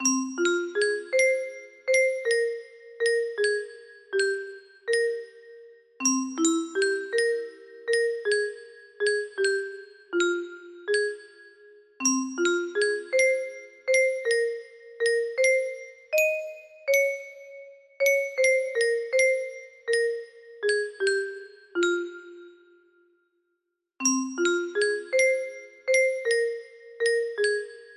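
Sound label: music box, music box melody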